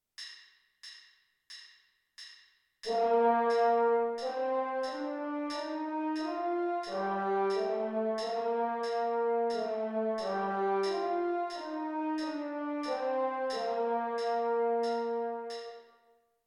f major scale french horn

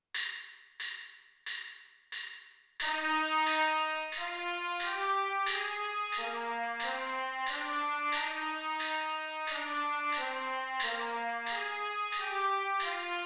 f major scale trumpet

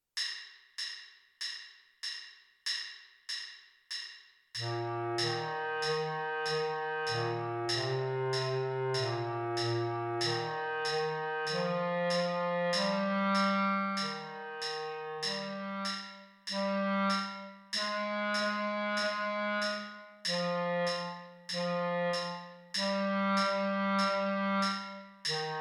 bingo bass clarinet

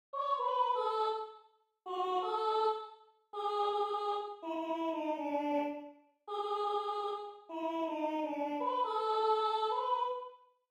soprano

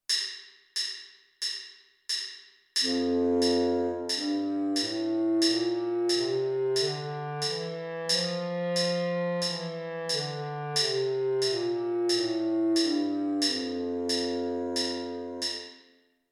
g major scale bass clarinet

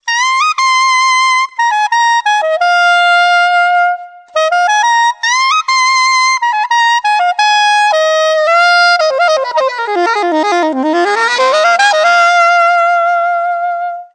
soprano saxophone

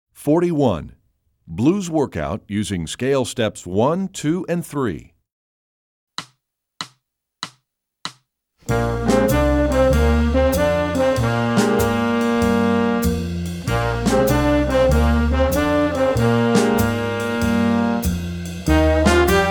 blues workout scale steps

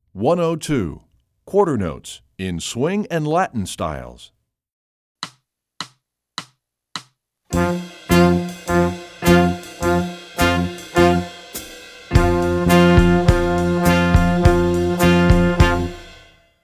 latin rock styles

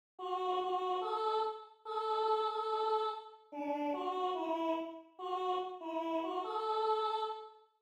alto

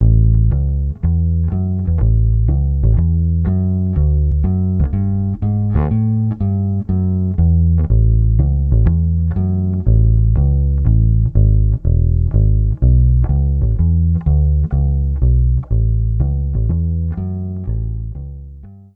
bass guitar 20th century pop rock

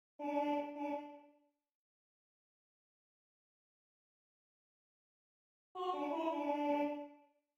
all altos; shrek donkey

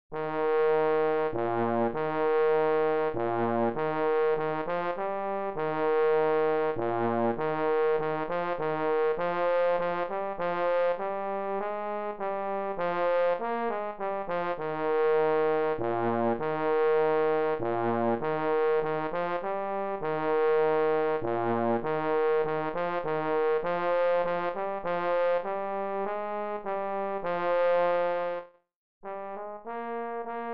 trombone baritone only; theme from the royal fireworks

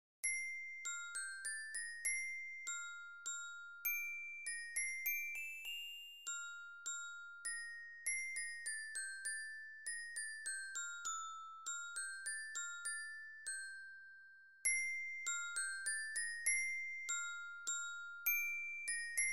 orchestra bells, bells only

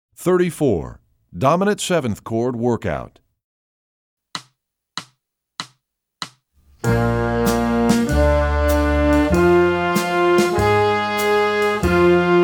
workout dominant 7th chord g7

